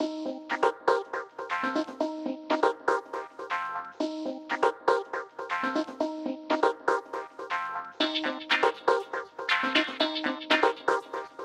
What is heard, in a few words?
Quick funk